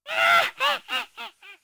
wining round; RedDot

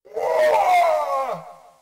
Big die; RedDot

